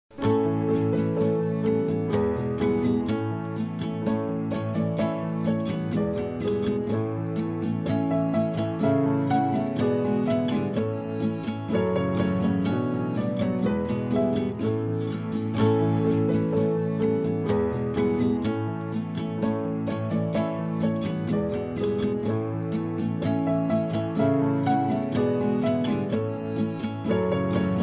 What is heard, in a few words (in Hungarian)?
gitar